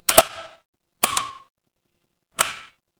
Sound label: mechanical switch